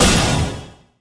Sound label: skele king atk hit vo